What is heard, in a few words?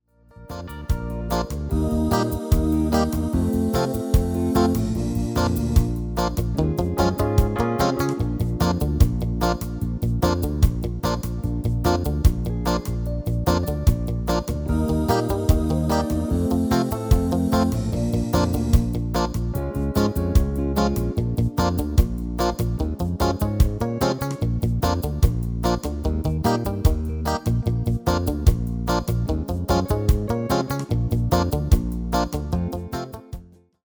MIDI